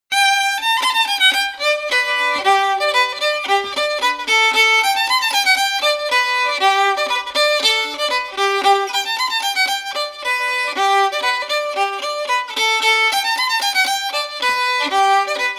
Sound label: reel fiddle; sixteen-hand-reel; SeamusGrantFestival; tune tutor